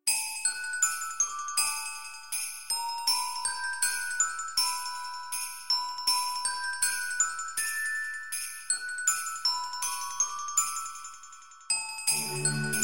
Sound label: Jingle Bells